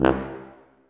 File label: TUBA, Tuba stacc; Roland L-CDX-03 Disk 2